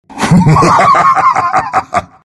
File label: laugh; doom bringer